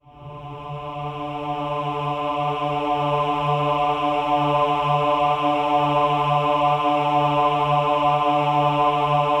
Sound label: OH-AH D3, choir; Spectrasonics; Symphony of Voices